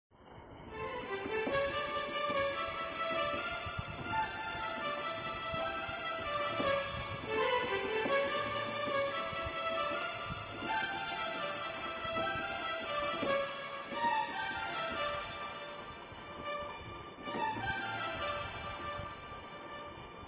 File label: bgm keyboard